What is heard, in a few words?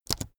keyboard laptop space press fast soft